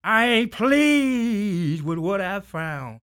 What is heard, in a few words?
SING, Blues Soul; Spectrasonics Vocal Planet